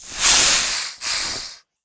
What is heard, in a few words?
Snake die